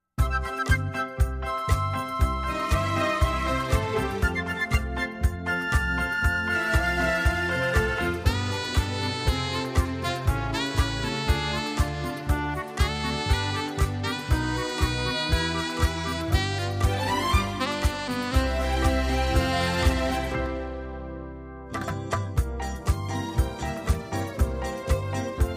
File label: X'an Devotional Karaoke